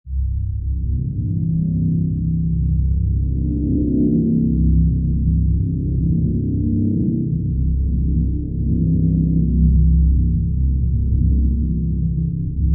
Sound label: Dark Drone Deep Waves; Dark Drones; Dark Drone Deep Waves Of Darkness; Systematic Sound; Tonal Elements Obscurum